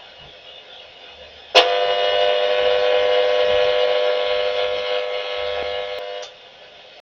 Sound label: CFL 530 kHz